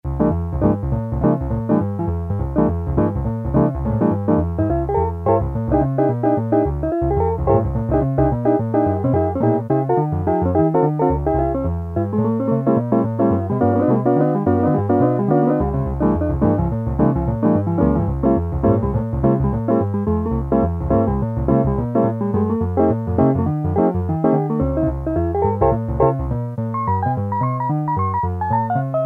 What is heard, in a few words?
piano, Casio MT-500; Tones